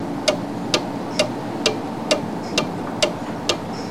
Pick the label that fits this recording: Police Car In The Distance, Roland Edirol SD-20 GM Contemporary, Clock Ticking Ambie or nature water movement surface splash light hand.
Clock Ticking Ambie